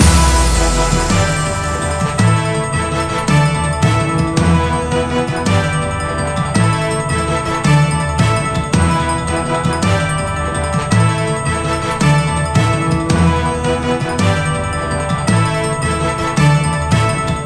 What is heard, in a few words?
big win loop; victorious